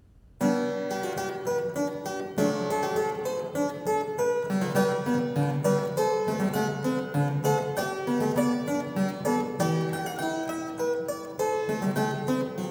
Prelude A Flat Major; Prelude No. 17; BWV 862; Bach - WTC - Tilney

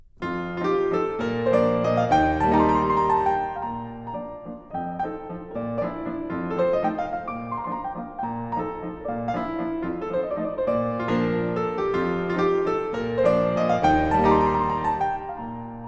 Mazurka in B flat, Piano

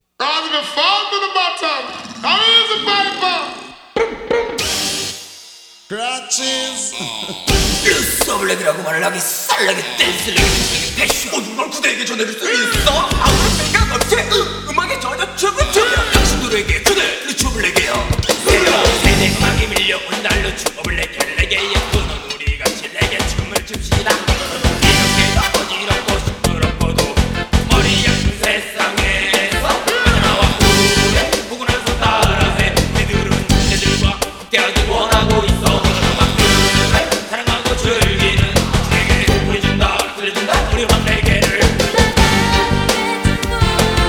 (House ver.)

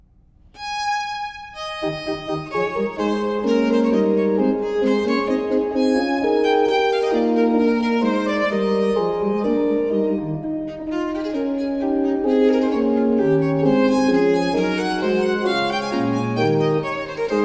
Violin Sonata, Allegro; Op.1 No.3; Handel

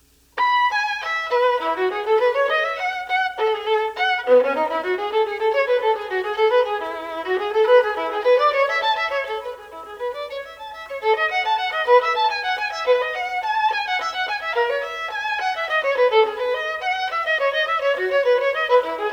Violin Partita In E - Gigue; J.S Bach; BWV 1006

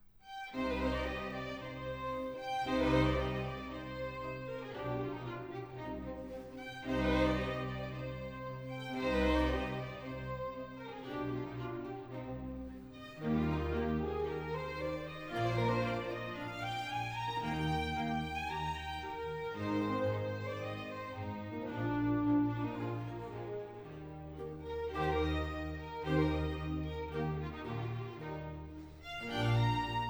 Sinfonia; Armida Al Campo D'Egitto, RV 699-A; Antonio Vivaldi (1678 - 1741)

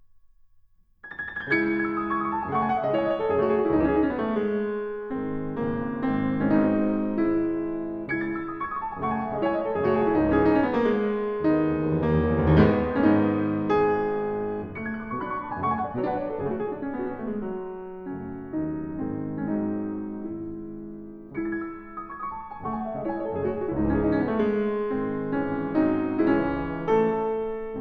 Prelude in C sharp minor, Piano; Chopin; Preludes

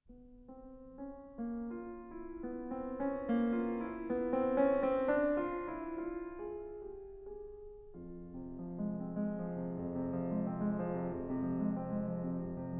Nouvelles Études f-moll, Piano; Chopin

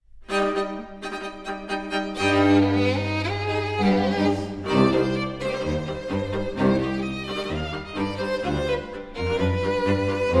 Tango version for string quartet

